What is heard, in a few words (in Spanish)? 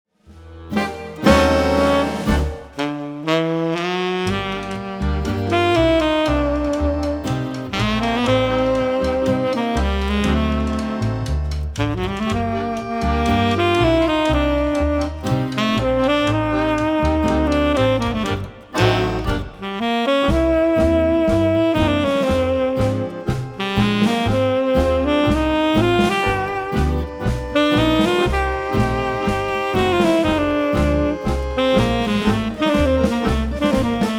Tango Nuevo Contemporaneo